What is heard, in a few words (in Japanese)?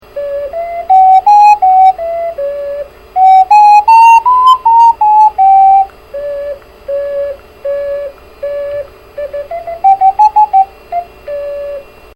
kaeru; カエルの歌; オカリナ演奏